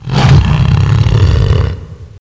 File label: pain